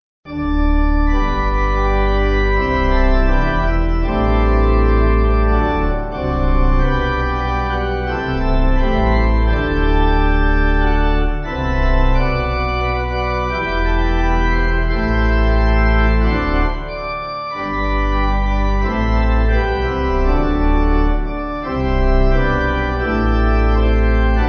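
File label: Pipe Organ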